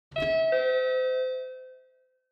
Ticket Gate Alarm Timbre1 Flap Far

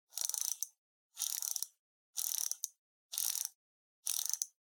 Pocket Watch Wind Up; 懐中時計; 巻き上げる

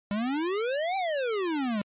NES General Sweep

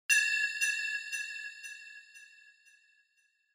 Single Accent Low Delay

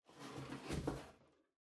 Cardboard Box Far Open; ダンボール; 遠い 開ける; 梱包材

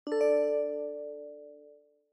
OS Sound (Speaker-Notification)

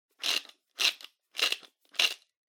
Spice Mill Salt

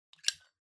Lighter (Open)

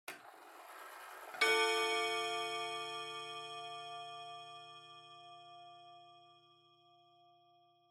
Pendulum Clock Chime Short

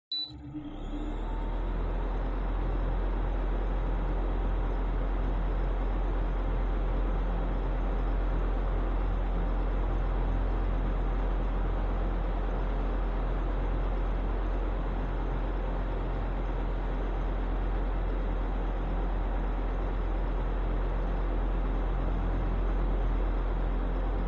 Electric Fan (Low)

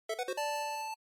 GB Shooter Score

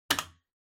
Doorbell Mech Tone Button

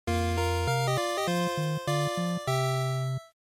NES Shooter Clear2

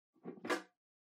Pendulum Clock Hardware Far Key Remove

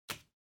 Bingo Card Put

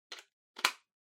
Power Strip Hand Gentle Plug In

